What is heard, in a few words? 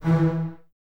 bass E 3, String Ensembles, Basses, Stacc; Miroslav Vitous; 90 s Sample CDs